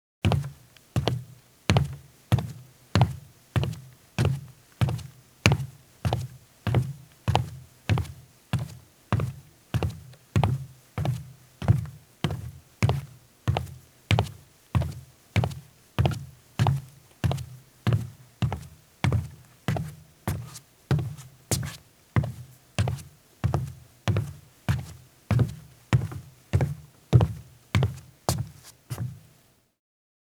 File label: Footsteps, Foley; Sound Ideas 6016